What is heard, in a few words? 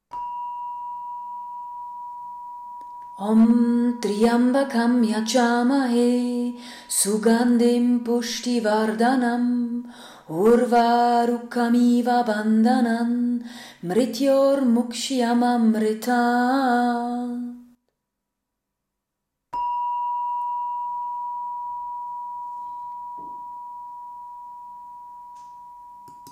Mahamṛtyunjaya Mantra